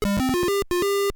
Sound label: level exit; lode runner